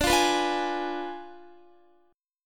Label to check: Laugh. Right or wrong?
Wrong